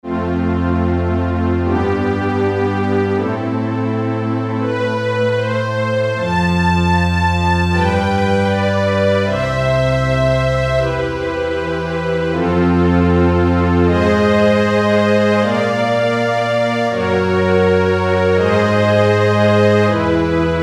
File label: JP8. Strings, Roland U-220; Factory Patches